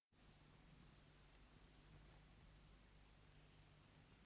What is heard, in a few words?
Dog Tone